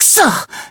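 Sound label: damaged, Japanese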